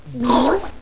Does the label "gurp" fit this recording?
Yes